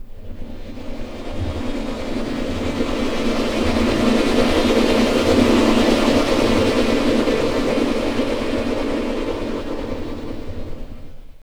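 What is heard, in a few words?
strings cluster; Roland - LA Composer vol. 2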